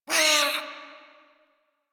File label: Feral Vocal Pain; Warframe; Catbrow